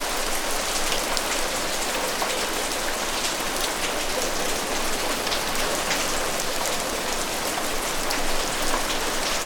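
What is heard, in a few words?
weather rain medium drips street; sound effects one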